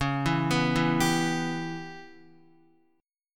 Db Minor 7th Flat 5th, Guitar; arpeggiated; x,4,2,4,4,2; 4E,4A,5D,5G,5C,6F

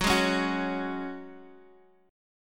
F sharp Suspended 2nd, Guitar Open G

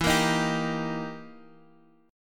E Minor Major 7th Flat 5th E down

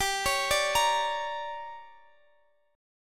D Major 7th Suspended 4th Sharp 5th, chord

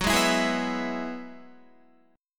Gb 7th Suspended 2nd Suspended 4th Gb down Guitar; Gb7sus2sus4; strummed

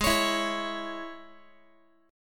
G sharp Suspended 4th Sharp 5th G sharp, Guitar; strummed

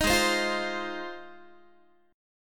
Ab 6th, Guitar; 18,16,15,15,16,x; Ab6 strummed

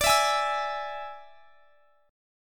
D Suspended 2nd Flat 5th; strummed

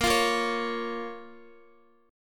Bb Minor Bb down Guitar; Bbm strummed

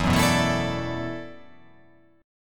E 7th Suspended 2nd Suspended 4th, down

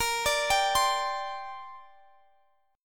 C 7th Suspended 2nd

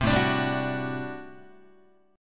A Minor 13th A Guitar; Am13 strummed; JGuitar

